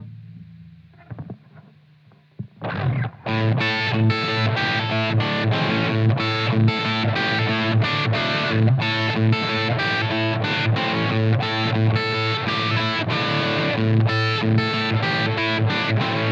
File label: Beer fuzz